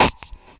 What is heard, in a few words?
VOC DRUM, MOUTH PERC; Best Service Hyper Dance